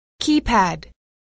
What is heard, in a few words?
keypad